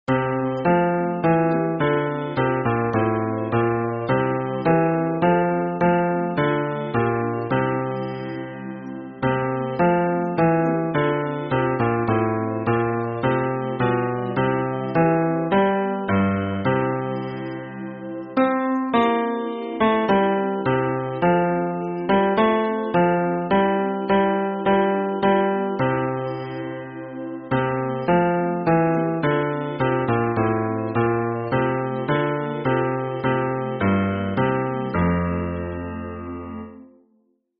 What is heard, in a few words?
hymn bass